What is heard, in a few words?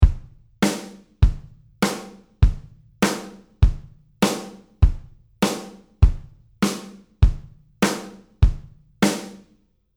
drum beats 100 bpm